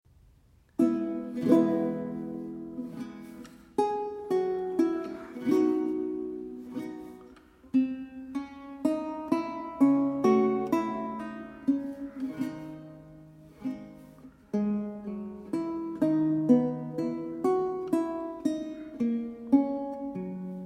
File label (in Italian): Sarabanda; Roncalli